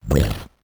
slime death; Crypt of the NecroDancer

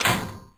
trapdoor open; Crypt of the NecroDancer

